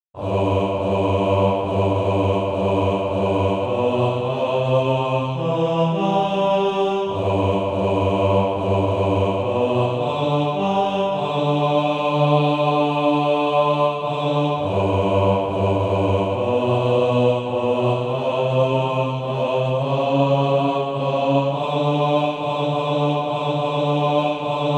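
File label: bass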